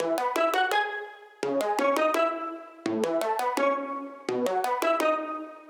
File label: Steel Drum Happy E 84; Sample Banks; Melody; Keyboards